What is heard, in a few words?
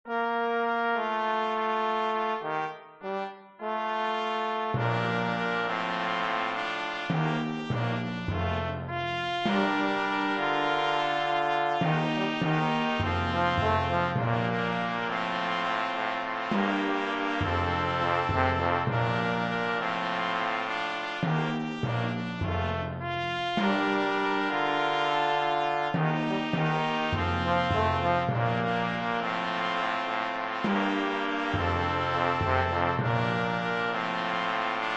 Brass; Salvation Alleluia